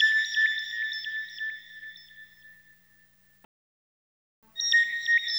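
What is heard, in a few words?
synth, Hip Hop and R&B Drumloops; Best Service ProSamples vol.01; AKAI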